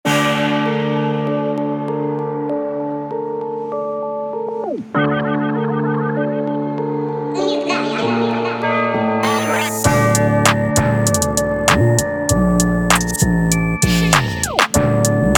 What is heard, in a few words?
Beat Rap 98BPM Dm; Beat Contest